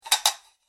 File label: Medium Side Plates Shuffled Together Sounds; Dishware; Household Sound Effect